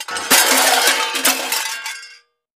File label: Junk Thrown Junk Pile, junk crashes; Junk Thrown Onto Junk Pile